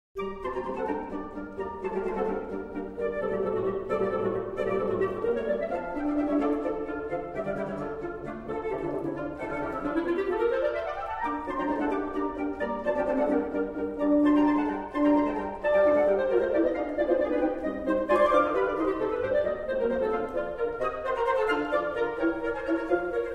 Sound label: Winds; Meloria